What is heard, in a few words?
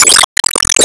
Fistball Hit; Se Sp Fistball Hit